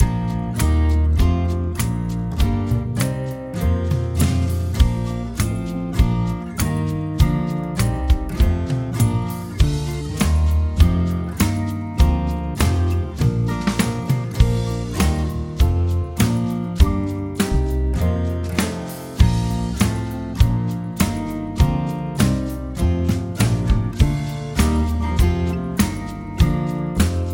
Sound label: Bb C Major Scale 100; brass